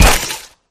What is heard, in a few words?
pop; flood